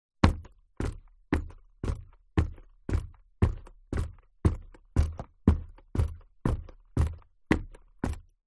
foley footsteps shoes business leather buckle zipper womans walking on wood floor; zapsplat